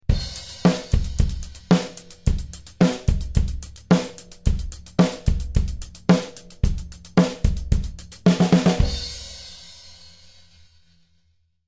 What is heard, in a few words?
16th beat